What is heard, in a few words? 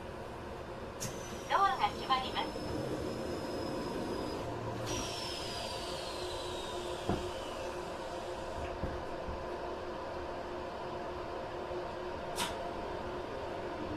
uchibo sotobo togane E257-500